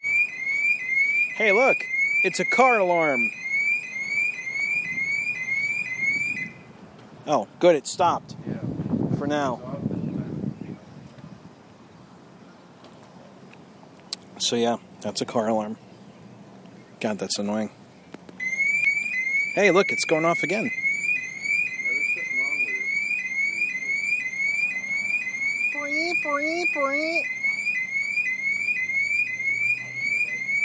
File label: an alarm